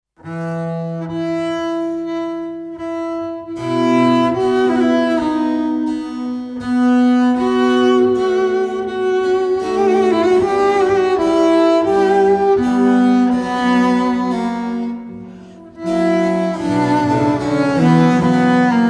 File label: Sonata; A Vivaldi; Satz1